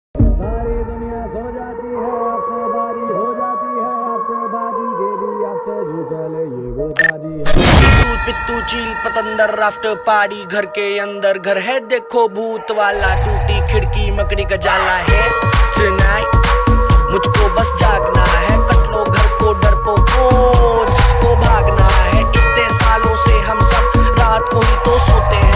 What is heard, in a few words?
slowed ringtone